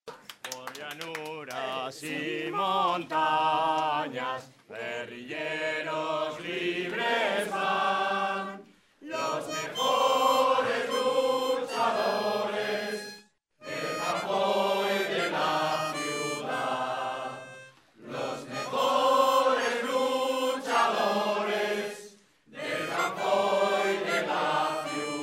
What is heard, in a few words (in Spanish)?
Himno Guerrillero